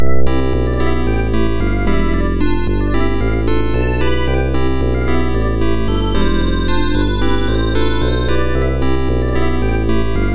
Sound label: General Purpose - Fun Atmosphere